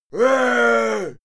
male fight scream; schreie; menschen; soundpool

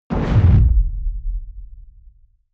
heavy sub noise bass

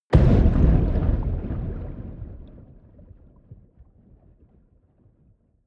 whoosh abstract hard

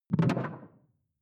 sci-fi alien bubble; swooshes-swishes

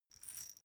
small glass pepper grinder turn; industrie-wirtschaft; gastronomie

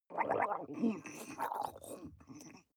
water monster female gargle blabber; koerpergeraeusche; comedy-cartoon; soundpool

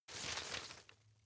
grab rustle short soft; berufe; foerster

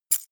key ring mid size catch; objekte; schluessel

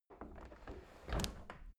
window handle close soft; objekte; fenster

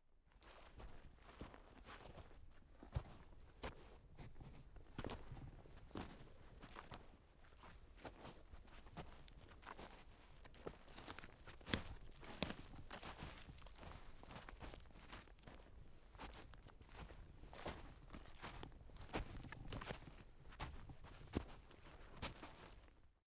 step forest ground

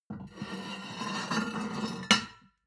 plate on plate take slide soft, restaurant cafe

